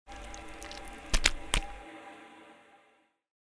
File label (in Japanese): zonbi